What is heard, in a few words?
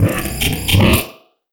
Criket Fx Fill, Cricket